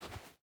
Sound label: footstep hide sand walk; Rust